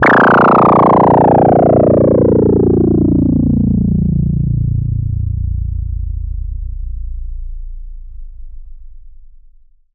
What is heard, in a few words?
FX, EMS VCS3